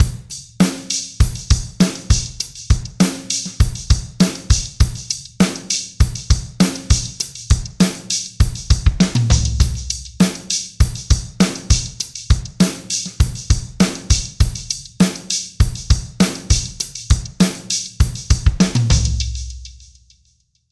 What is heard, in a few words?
delay manglers party hats wet; aas; objeq delay